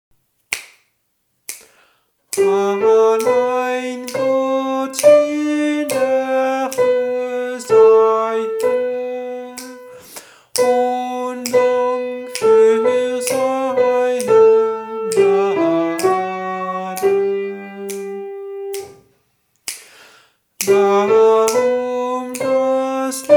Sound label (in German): allein gott soprano